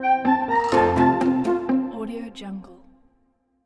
positive logo; v8v

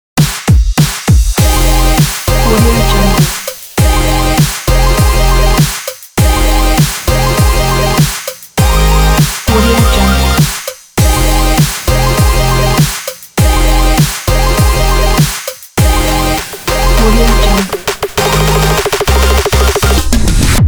energy dubstep logo

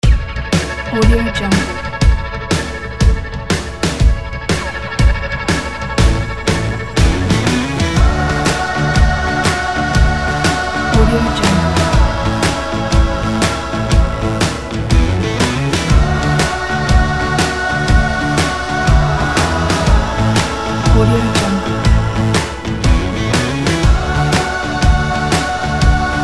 indie glam

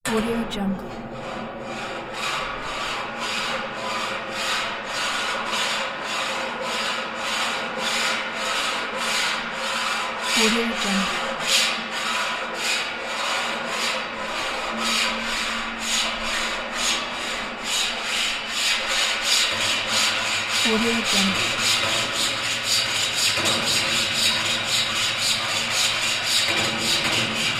hand saw

